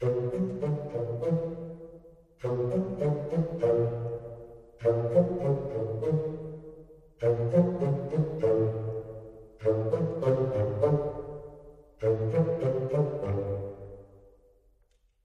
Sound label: Bassoon Staccato